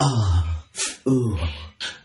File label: Beatbox, Drum loops